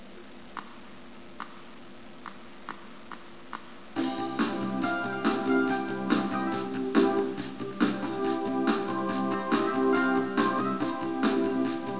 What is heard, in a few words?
ROCK